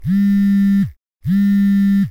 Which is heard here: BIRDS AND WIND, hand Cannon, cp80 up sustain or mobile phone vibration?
mobile phone vibration